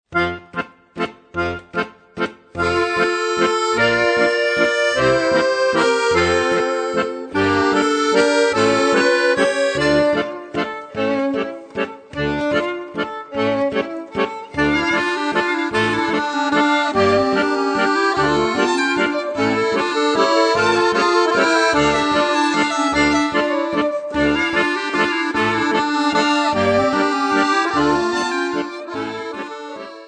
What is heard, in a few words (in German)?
Israel, Accordion; Hine ma tov